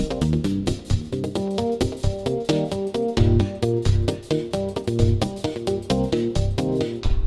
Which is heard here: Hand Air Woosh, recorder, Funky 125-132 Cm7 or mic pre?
Funky 125-132 Cm7